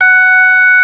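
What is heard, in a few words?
ORG. 3 F sharp 5; Best Service; Advanced Media Trax Modern Composer; B3 FULL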